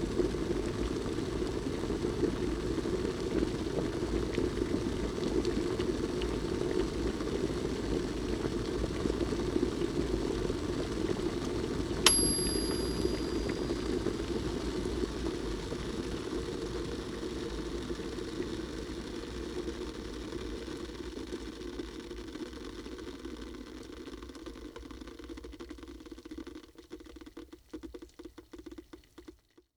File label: Electric Tea Pot Boiling; Cooking